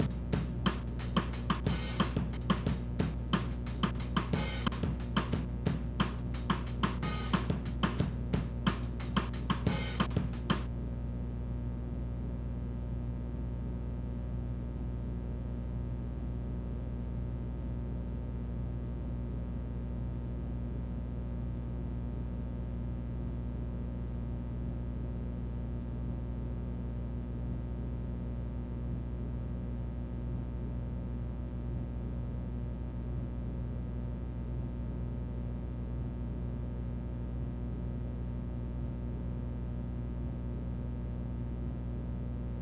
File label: drum loop groove 90